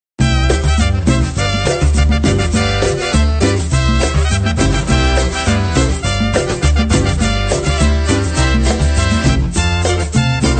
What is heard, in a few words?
funny cartoonic musical tone ringtone; funny ringtones